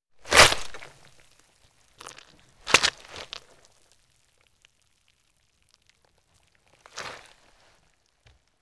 物体碰撞

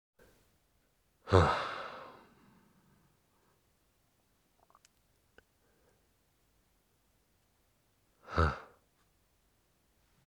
叹息(男人)

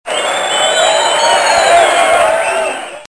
愉快 APPLAUS

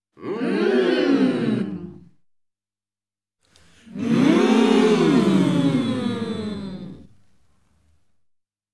众人 成年 欢呼 短 长

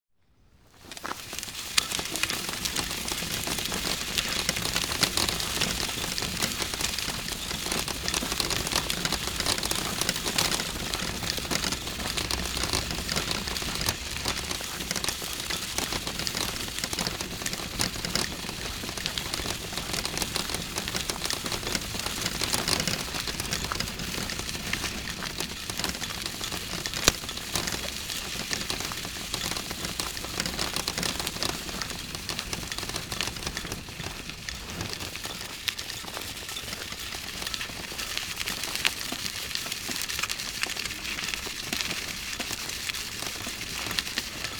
沙地上行走的婴儿推车(连续)

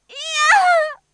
痛哭

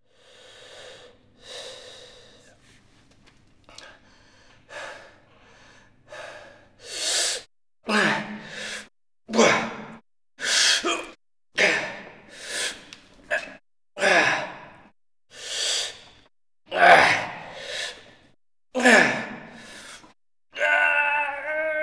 呻吟(提升重物)

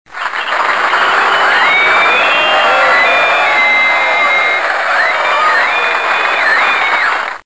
愉快 appl clap

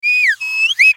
人类吹口哨的声音

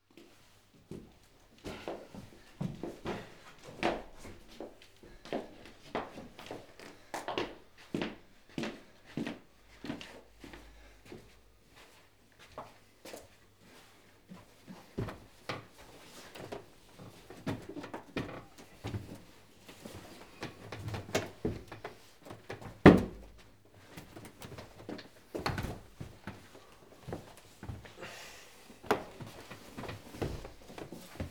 运送重物下楼

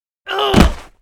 女死亡、倒地

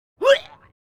呻吟

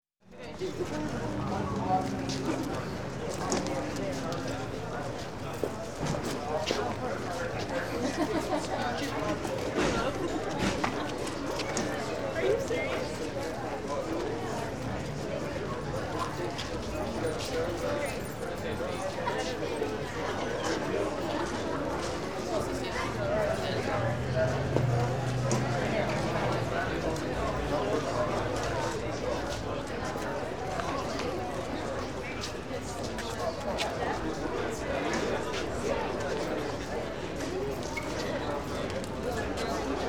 排队、户外嘈杂声

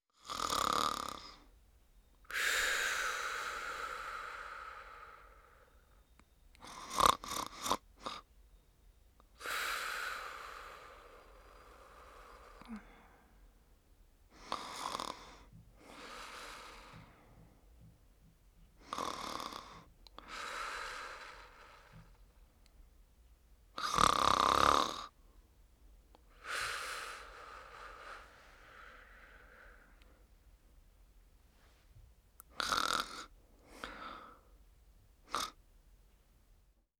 女人鼾声; renlei

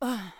女跳跃落地

